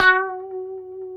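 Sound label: F#4B, compressed strat, guitars; Spectrasonics; Hans Zimmer Guitars VOL-1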